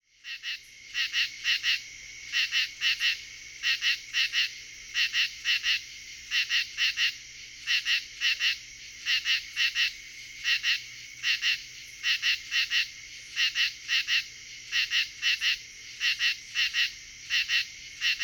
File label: true katydid closeup